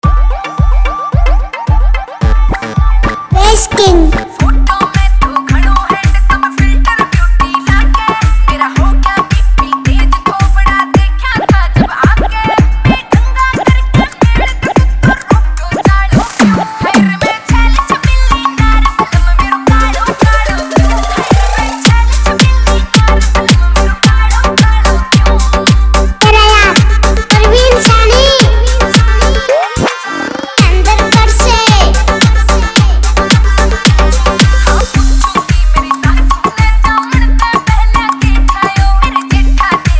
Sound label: hard bass remix